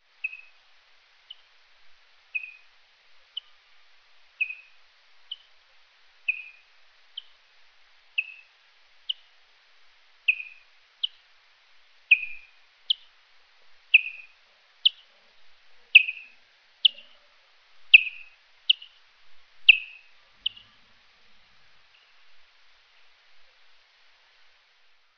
large eared pied bat

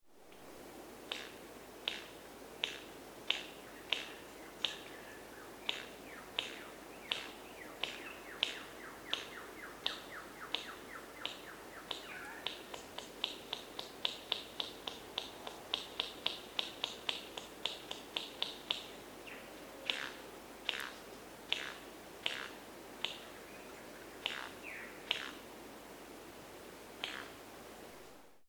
clicking; nrbenbullen

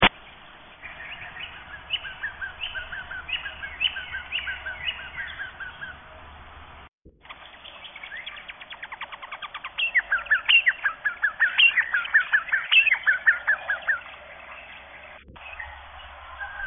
BIRD fuscous

